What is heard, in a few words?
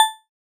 navigation